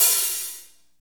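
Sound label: HAT hi-hat, Motown Kit; Northstar; Drumscapes Roland; Motown Kit2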